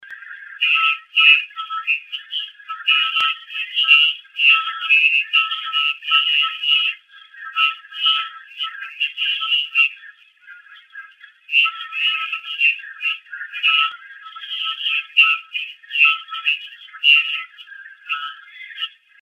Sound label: vocal sample